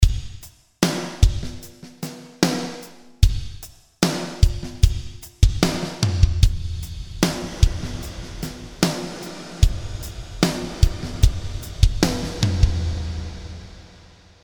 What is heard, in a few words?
Drums Decay